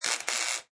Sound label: Arc Welder Short Burst, Welding: Industrial arc welder, short burst